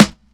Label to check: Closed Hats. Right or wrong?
Right